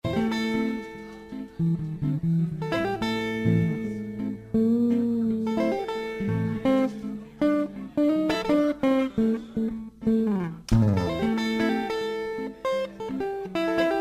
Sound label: swing